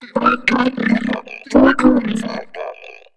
two head; Star Wars Knights Of The Old Republic I; XBOX

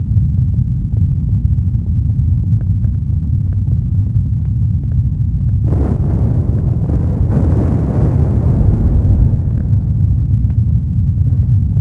Rumble Flames Explosions; noise; Max Payne; XBOX

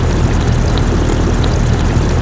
loop, ships engine; Quantum Redshift; jaya

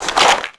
Pickup Ammo box; level items; Max Payne

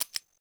move, menu; Max Payne

